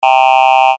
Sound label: Vocal A